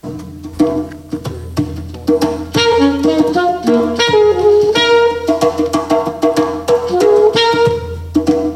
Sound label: 16th note syncopation, jazz; rhythmic nature of jazz; Mike Longo